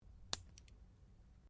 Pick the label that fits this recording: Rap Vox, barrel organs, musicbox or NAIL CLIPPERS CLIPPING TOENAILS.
NAIL CLIPPERS CLIPPING TOENAILS